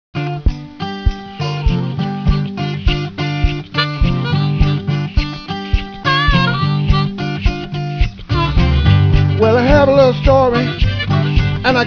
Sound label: hi Country